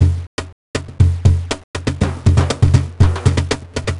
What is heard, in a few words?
Loop; Junior Mystery Quest; nds